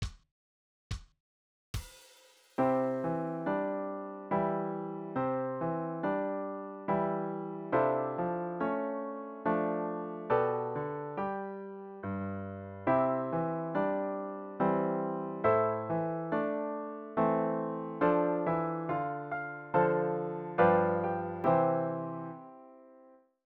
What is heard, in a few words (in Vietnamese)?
Dieu Waltz